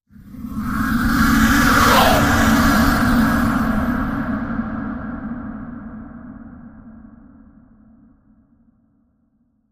Unfriendly Troll Transition

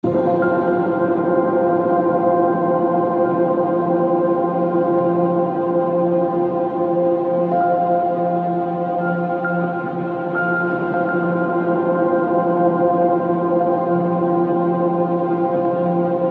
Clouds Glassy Key Pulse

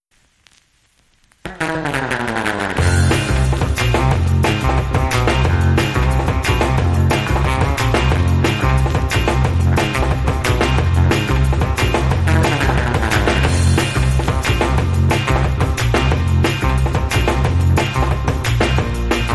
Rockabilly Skank

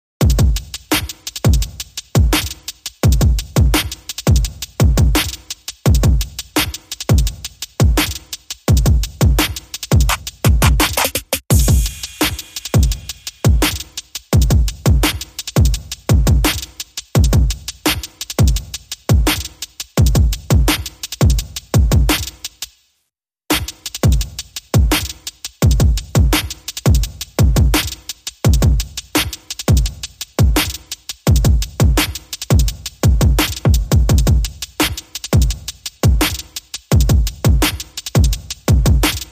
Simple Drums Only

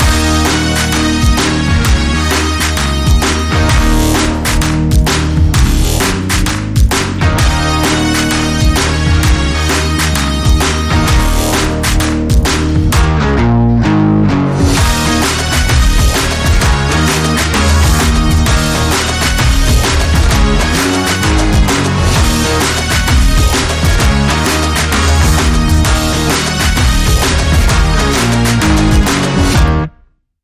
No Lead

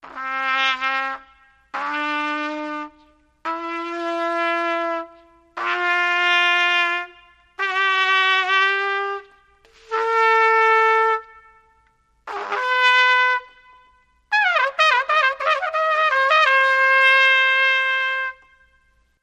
Trumpet Bad Practice Scale With Reverb

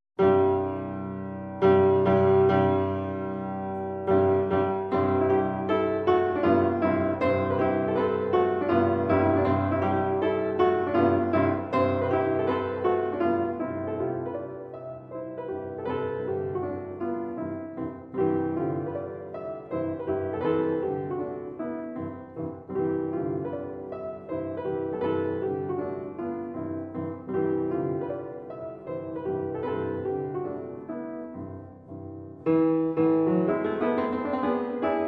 Mazurka in C major; Op 56 No 2

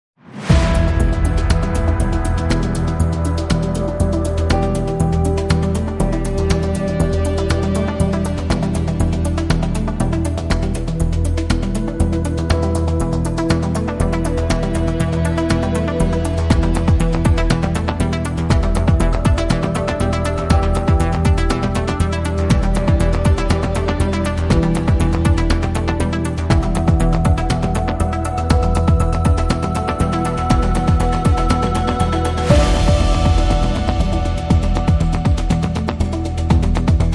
No Strings

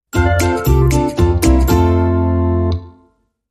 Stinger

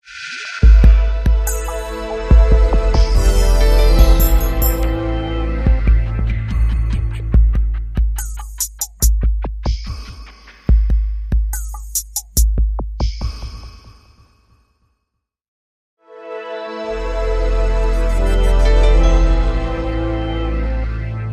Melody Only Mix Beats Only

